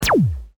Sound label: PAN LASER